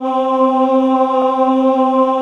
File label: VOI CHOIR; VOICES; Sound & Vision - Gigapack I CD 2